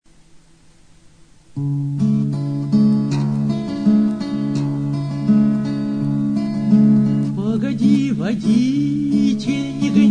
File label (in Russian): Gitara